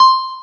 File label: piano